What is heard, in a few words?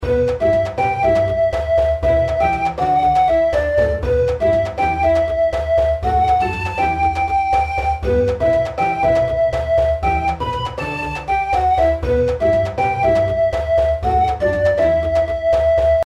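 intro background music, lost realm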